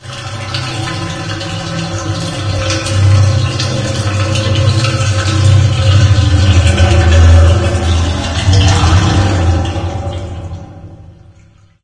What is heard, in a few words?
ambient water drain; source engine; portal2